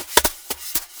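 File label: jazz drum snare drum; AKAI S6000 CD-ROM - Volume 1; DRUM RYTHM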